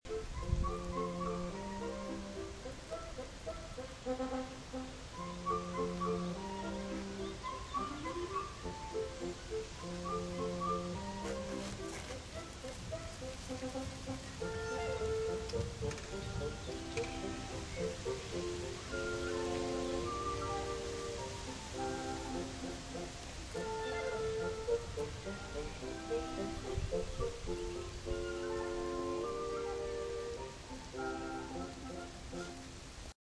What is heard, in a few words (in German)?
musik im park berlin steglitz